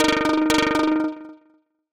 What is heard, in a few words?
alert, notifications; Android